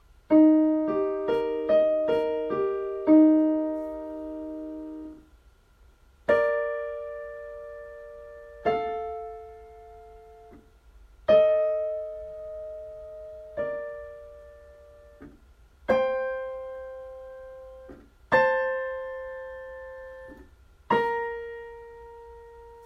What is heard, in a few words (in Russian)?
Интервалы